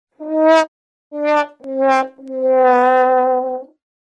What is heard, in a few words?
Fake sad trombone